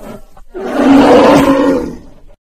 bear death; animalsvotive; Minecraft; Votive 1.21.1; Votive Content

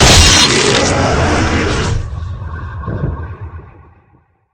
mass attack; minecraft; Votive Content

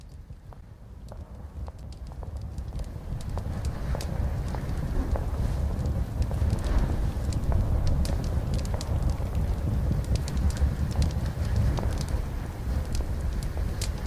hall; Minecraft; Votive 1.21.1; Votive Content

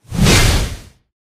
warrior slash; Minecraft; Votive 1.21.1; Votive Content

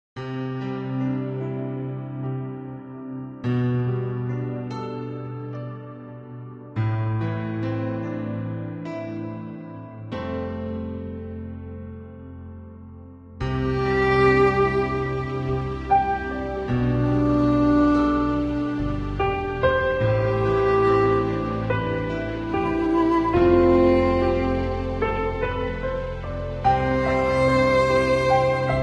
love violin; skripka; Minecraft; Votive 1.21.1; Votive Content

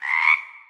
frog idle; animalsvotive; Minecraft; Votive 1.21.1; Votive Content

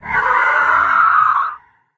griffin sound; Minecraft; Votive Content

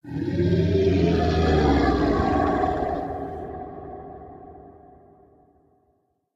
one attack; Minecraft; Votive 1.21.1; Votive Content

